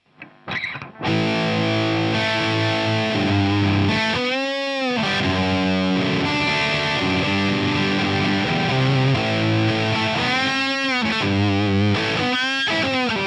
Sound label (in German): Laney VH100R Tuning II, Drive Gain voll, Drive halb